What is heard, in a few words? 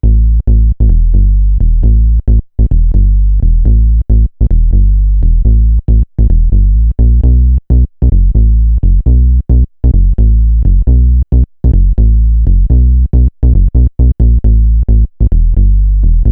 Synth loop 133 Am, Synth Bass Loops; Slate Digital BASSHOLE Sample Pack